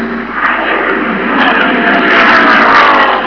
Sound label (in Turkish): araba geçiş; tasitlar